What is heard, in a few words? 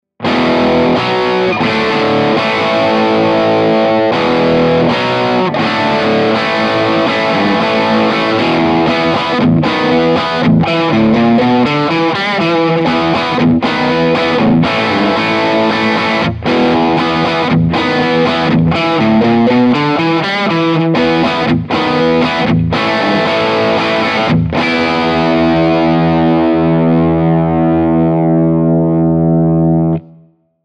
rock chords; jensentone